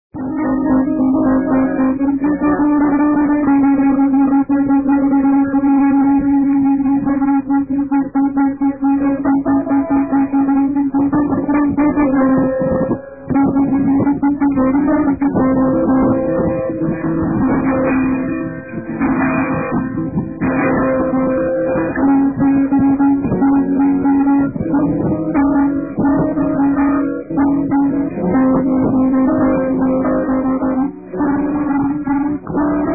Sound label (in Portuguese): violao